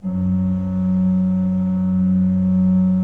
F1, Cathedral Organ; ILIO; Propeller Islands Cathedral Organ

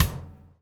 DOWN, DOWN KIT 78

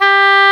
oboe dry; Roland L-CDX-03 Disk 1; Oboe 4-8

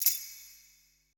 E SHELL, ETH PERC

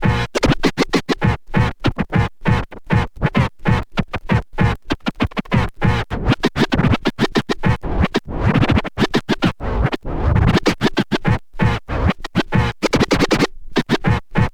scratch long, Vinyl Attack, scratches

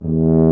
BRS TUBA, Solo Instruments

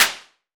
Noise Slap; Roland BK-5; Claps & Snaps

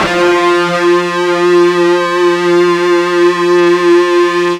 FUZZ F 2, Guitar; East West; Steve Stevens Guitar Samples Collection

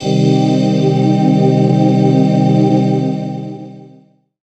pad, Dance Synths; Best Service ProSamples vol.37 - Dance Synths

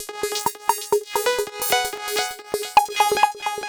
Synth, 130bpm, Nu Dance Electro; Zero-G; Soundclash; Combi Loops; Insania

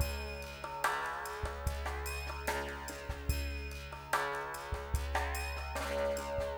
BOLERINO; Spectrasonics; Liquid Grooves 1 & 2

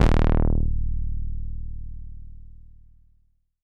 Sawtooth d#1, Korg Poly-800

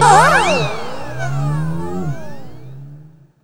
GAME NOISE; USB Soundscan vol.27

